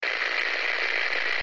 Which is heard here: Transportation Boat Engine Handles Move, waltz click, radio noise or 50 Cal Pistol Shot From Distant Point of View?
radio noise